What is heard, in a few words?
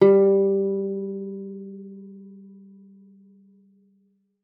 G3, GUITAR; Xsample VOL-2